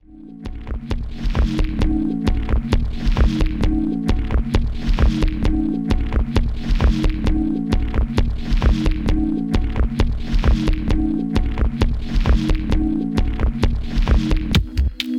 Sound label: loop